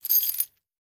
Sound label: Chains; WeeklySFX